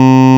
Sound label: vox, Digital Synths; Ableton Foundation FreePacks